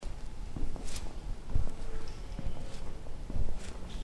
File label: Pinball; Alcatraz; Cell Block